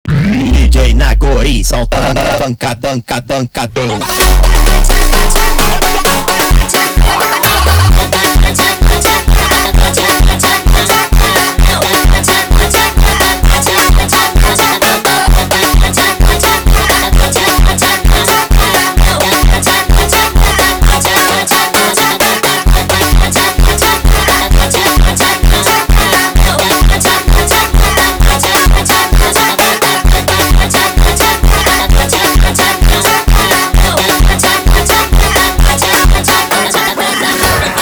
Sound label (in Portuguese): Montagem